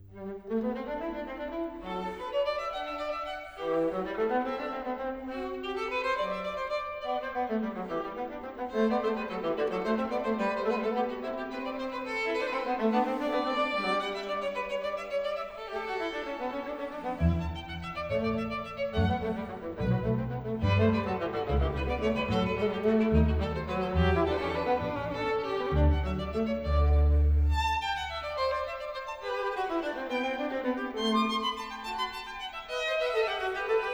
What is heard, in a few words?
Variation 27 Canone alla None, strings; Bach; Goldberg Variations; Sitkovetsky; NES Orch